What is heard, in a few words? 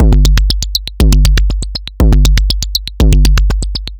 Anabeat Mix, Beats